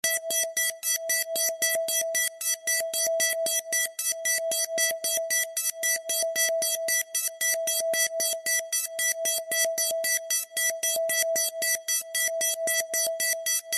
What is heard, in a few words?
science fiction alarm warning emergency med fast beeping; zapsplat